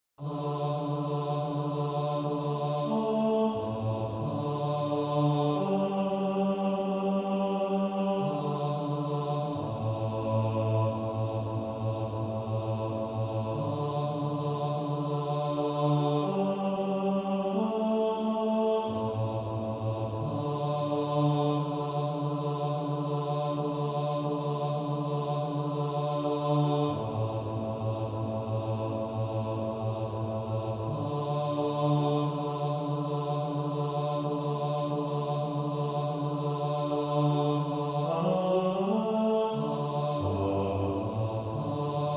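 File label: Who Will Follow Jesus bass, choir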